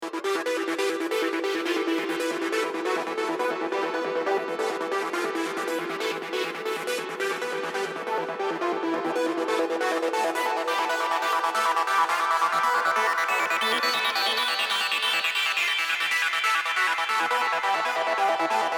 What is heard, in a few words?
Draft Melody